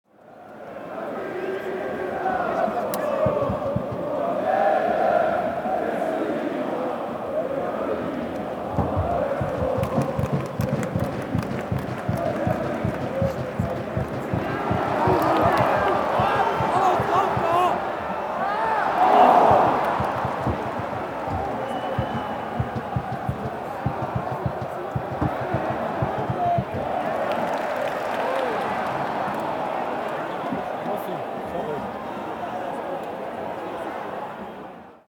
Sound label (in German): Stadion Fans und Gesaenge